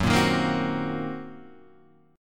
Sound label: Gb 6th Flat 5th Gb